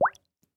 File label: bubble